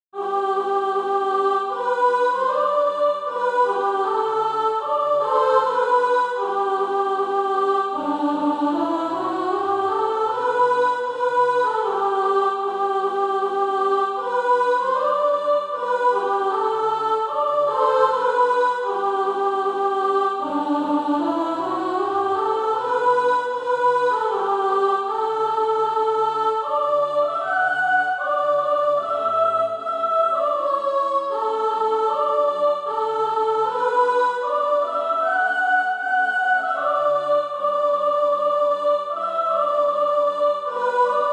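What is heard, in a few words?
soprano, Practise Music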